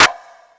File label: Beat Box; E-MU Pro-Cussion